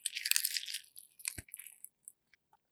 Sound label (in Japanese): 引き抜き